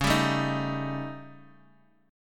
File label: Eb 7th Flat 5th Db, guitar